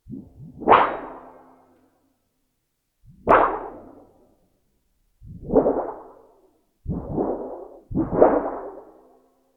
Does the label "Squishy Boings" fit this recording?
Yes